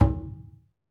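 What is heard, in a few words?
perc, skin drums; Roland LCDP14 Africa VOL-2; Af.Hand Drm2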